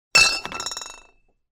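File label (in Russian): одна о другую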